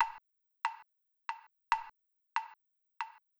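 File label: drum Loop 140BPM Perc Wood delay; Vengeance - Essential Clubsounds Vol.5; VEC5 Drumloops; VEC5 Multi Loops 140BPM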